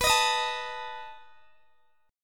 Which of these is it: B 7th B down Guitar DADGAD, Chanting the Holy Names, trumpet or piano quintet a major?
B 7th B down Guitar DADGAD